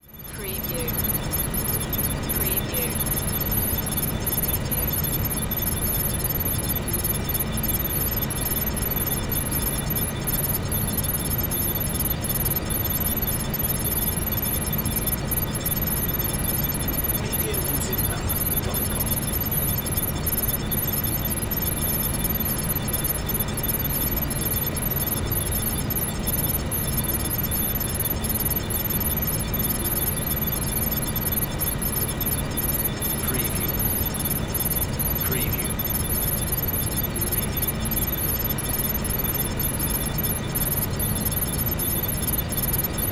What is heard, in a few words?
Computer Room Beeps